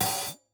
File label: hat open close